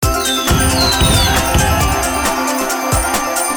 count up, slot; Hot 40

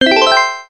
choice win symbol; games; Aztec Secret

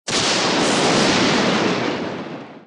lightning flicker; Forest Nymph

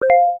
hit, keno; games; Bingo

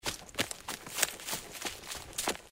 assassin running; Ming Imperial Guards

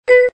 card hold all; games; poker; American Poker The Legends II

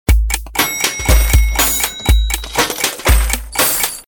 WAIT USER CHOICE; games; Dazzling Diamonds

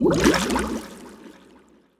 freespins symbol devide; Hungry Shark; Wazdan

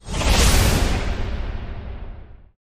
respin acceleration; games; Aztec Gold Megaways

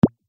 stop; Candy Storm

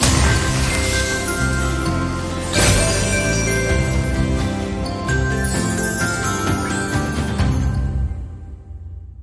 superwin; Great Book Of Magic Deluxe; Wazdan

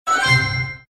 WIN; Lovely Mermaid

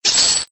x2 MONEY; Hot Target

